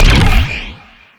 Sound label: jelly bounce